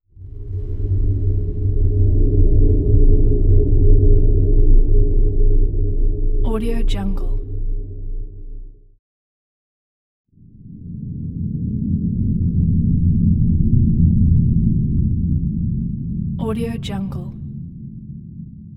huge spaceship fly by

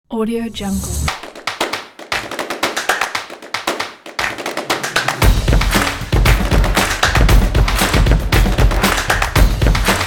claps percussion opener

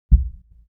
human body heart beat single low; smartsoundfx

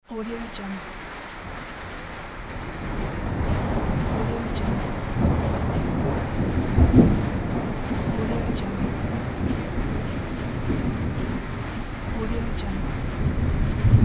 rain and thunder pack; m-i-r-k-i-c

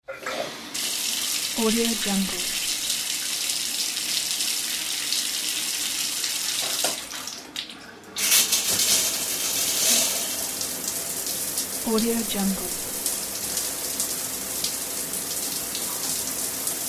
water stream

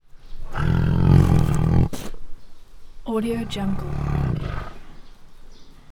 tiger snarl; prosoundfx

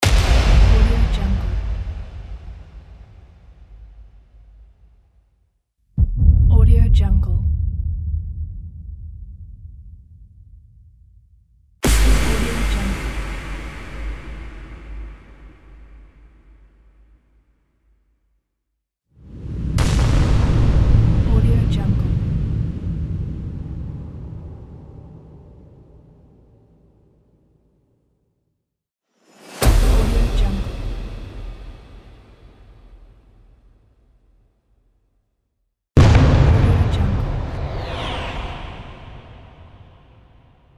explosion and hit

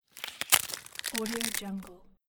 tree bark

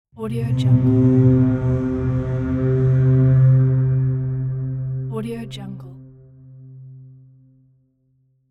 ancient battle horn

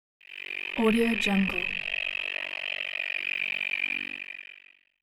synth grinding beeps; hollywoodedge

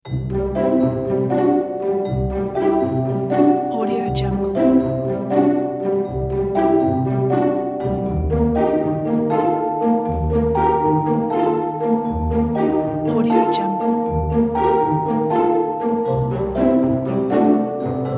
magical

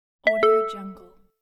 deny notification

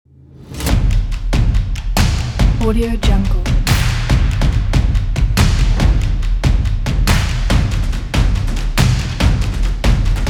percussive stomp

